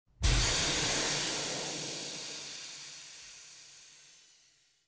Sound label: Air Release Large